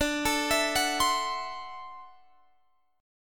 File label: D 9th D down Guitar Open G